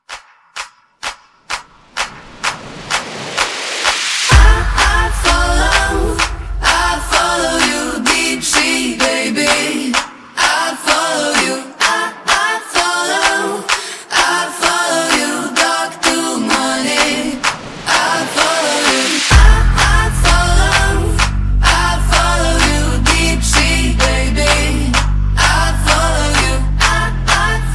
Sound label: Clap Apella Party Starter